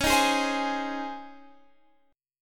C sharp Major 7th Suspended 2nd, Guitar, Drop D

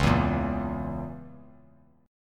Db Minor 6th Db down; Dbm6 strummed; JGuitar

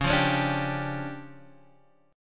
D Minor Major 11th, C sharp

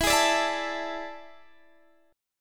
A Major 7th Suspended 4th E

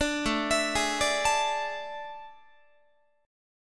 A Major 7th Suspended 4th, Guitar; AM7sus4; arpeggiated

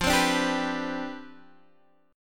C Major 7th Suspended 2nd Suspended 4th F

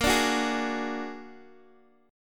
Bb Augmented 7th Bb down Guitar Open D

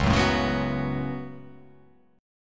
C sharp 7th Suspended 4th Sharp 5th C sharp

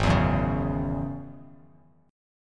Ab 7th Suspended 4th Sharp 5th Ab down; Ab7sus4#5 strummed

